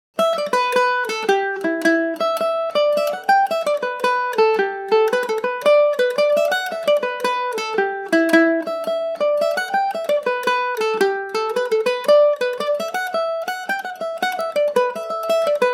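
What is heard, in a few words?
jig E dorian, irish mandolin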